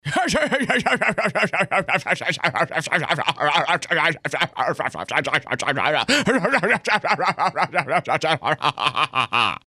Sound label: Laugh